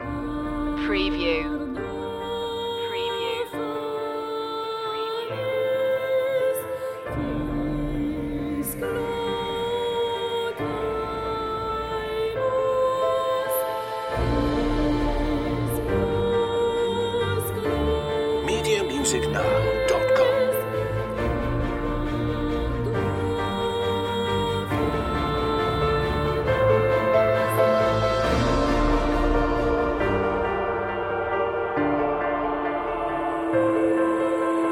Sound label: the fountain of life no drums relaxed